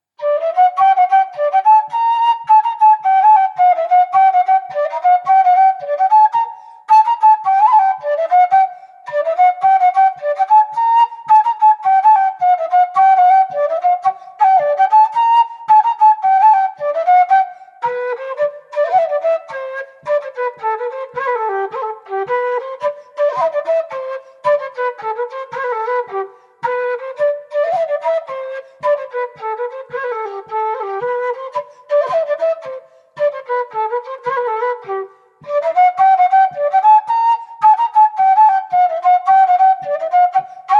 Bourree

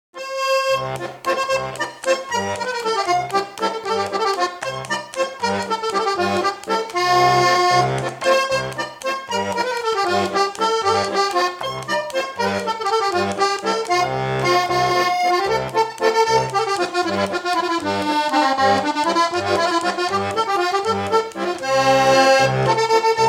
Bourrée Valse

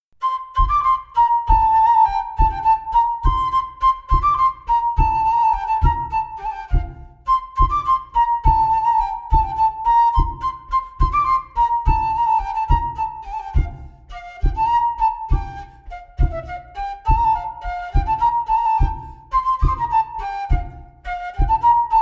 bourree; Coatrieux

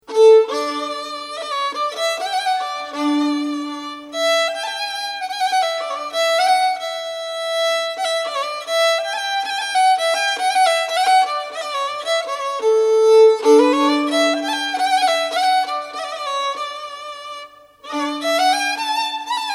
impro majeur; Perrier

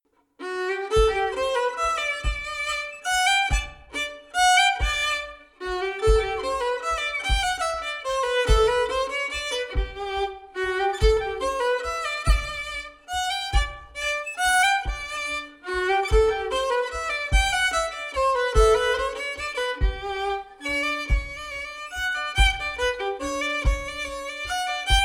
Valse

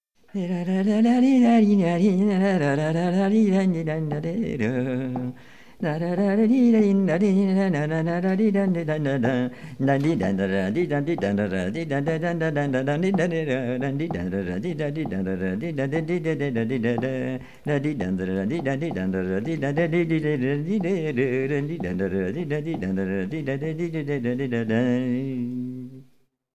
Mazurka

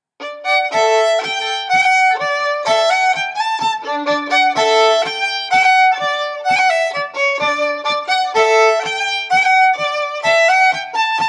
Bourree

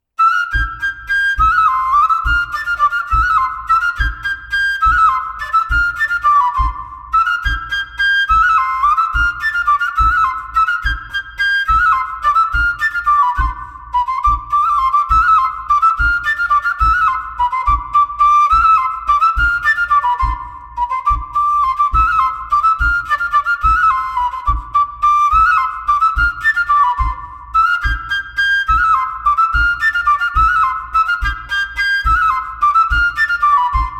Montagnarde; Claussmann

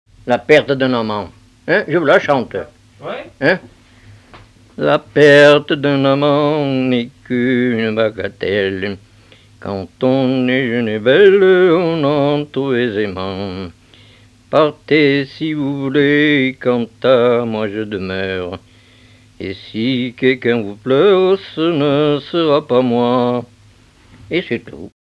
Mazurka; Mazurka La perte deux amant

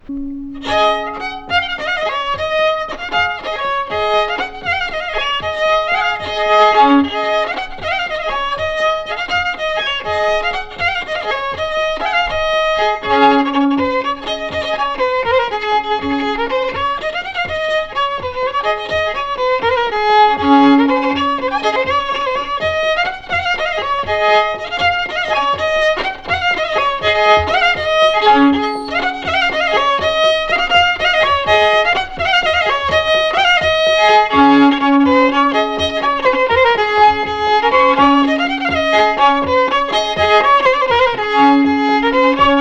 Bourrée; Bourrée du Trech

